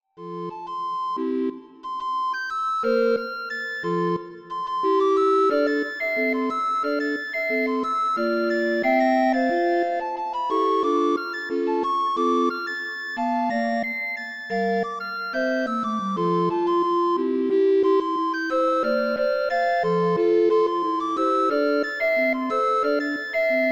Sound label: Recorder Ensemble